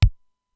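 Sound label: Simmons SDS-9 bass drum, Drum Machines; Factory D2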